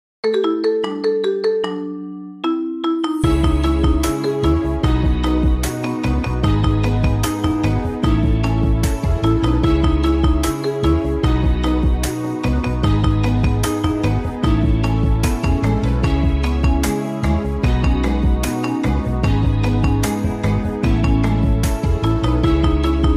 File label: marimba remix